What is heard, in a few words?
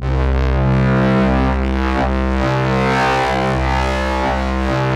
FLYER C1 L, Yamaha VP-1; Music Store; 90's Sample CDs